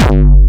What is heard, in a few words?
BD Distortion, Bassdrums; Vengeance Samples